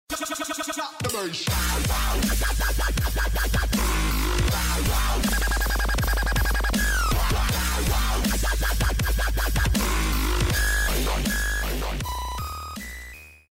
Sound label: sped up